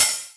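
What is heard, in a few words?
Hat Open, Novation Drumstation; DrumstationV1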